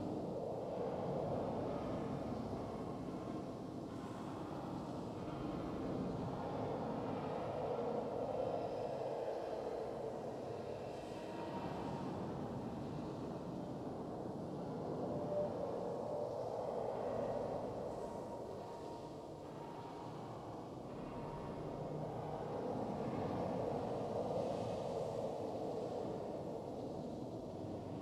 atmos cave; Hollow Knight: Silksong

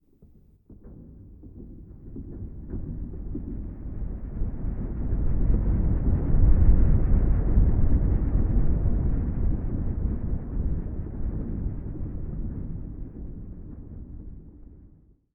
rumble

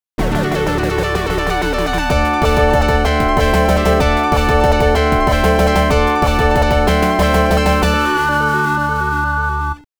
All Stages Clear; Mega Man The Wily Wars; The 16bit Audiophile Project; Genesis

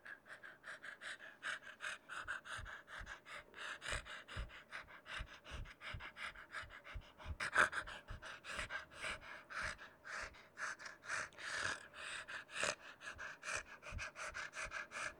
pant loop slower

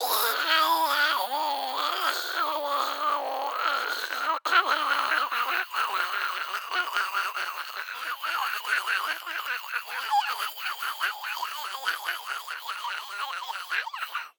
bone spitter vox sing loop

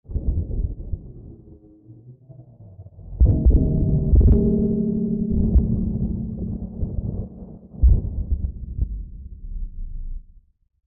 bone big gate open sequence vibration; Hollow Knight: Silksong

